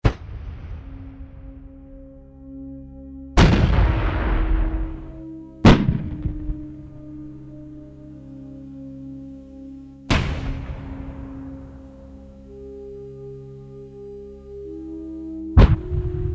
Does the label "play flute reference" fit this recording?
No